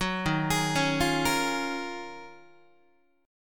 Eb Minor 11th Eb down Guitar 4A,4E,5A,5D,5G sharp,6C sharp; Ebm11 arpeggiated; JGuitar